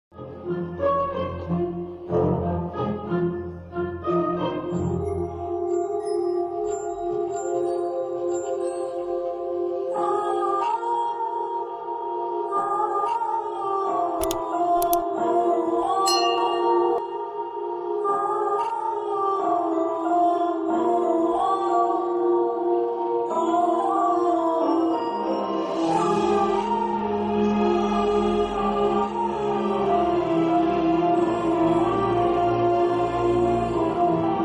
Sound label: emotional bgm ringtone; waltair veerayya; chiranjeevi